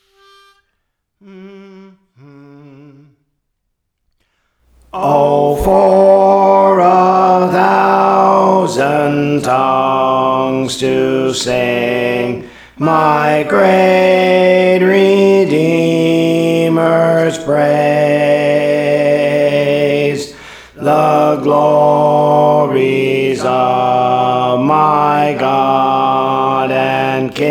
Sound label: Alto, Songs; Praise For The Lord Training; Belmont